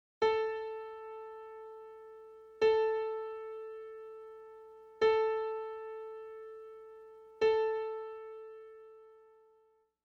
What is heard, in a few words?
Tuning Note A 440